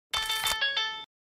text notification

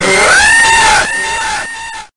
scream; neo ridley

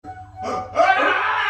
old man falling

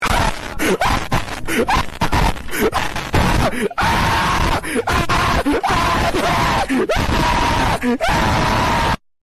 snake screaming

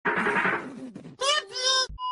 knock knock; pingu

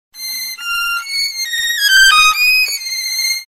worlds smallest violin

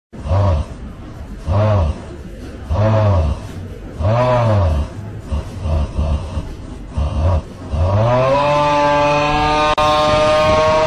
hhauuugh

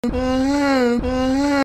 ahem; mister v

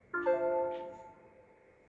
windows 8 1 error

